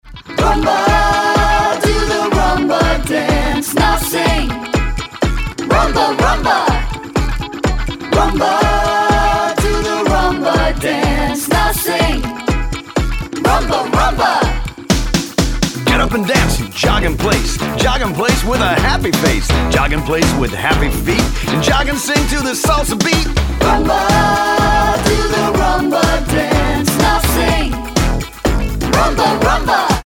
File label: rumba dance sample